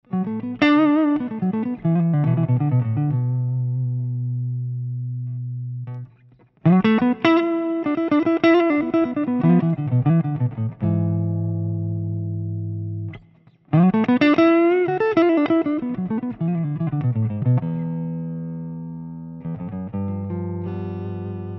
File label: clean; WGS12L